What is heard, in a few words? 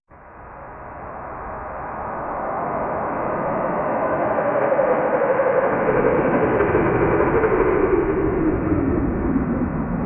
sound of a large drone